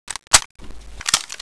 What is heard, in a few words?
ruger 77 reload